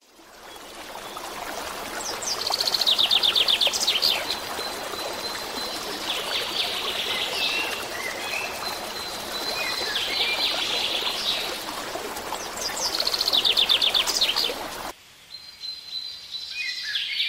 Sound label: animal sound healing